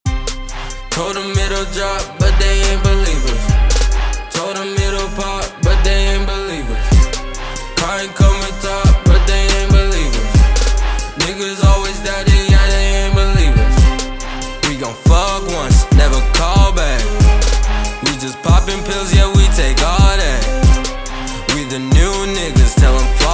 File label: Hip Hop